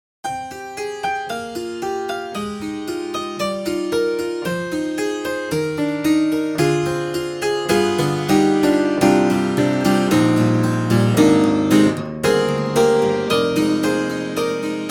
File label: Tack Piano Bright; Spectrasonics; Keyscape; Wing